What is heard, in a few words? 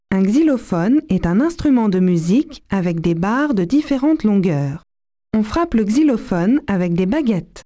xylo